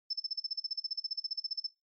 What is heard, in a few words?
Cyber